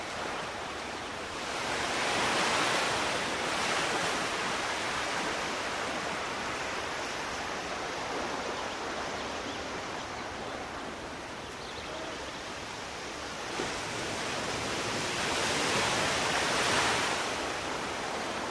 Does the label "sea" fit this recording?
Yes